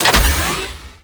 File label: Ship Rescue Tube Open; Gameplay; Ambience; Warframe; Corpus Ship Remaster